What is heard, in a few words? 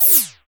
VIDEO LG F; TEK PERC3